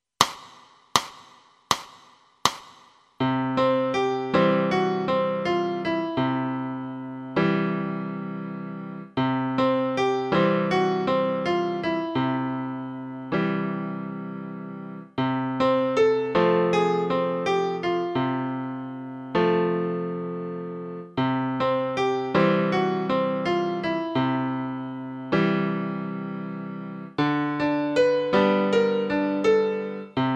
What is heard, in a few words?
piano